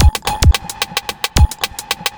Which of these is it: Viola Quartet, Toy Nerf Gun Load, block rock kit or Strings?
block rock kit